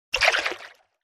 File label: fisherman hit sound